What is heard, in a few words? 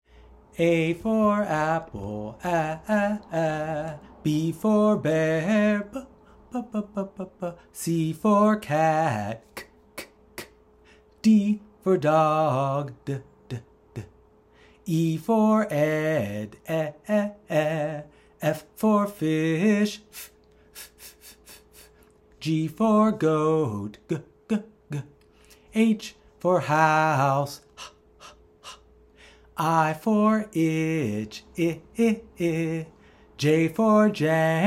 sound letter song